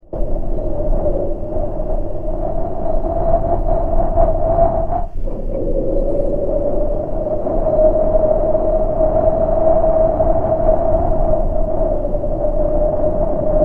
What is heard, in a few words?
Mouth Wind Howling